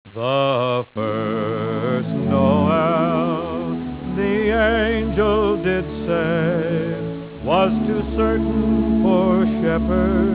music

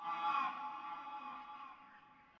Dino Distant; docks

